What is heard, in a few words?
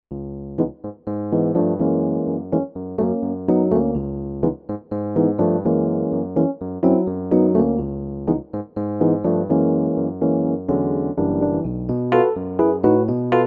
casio px 200; dobra klawiatura